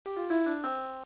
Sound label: Drop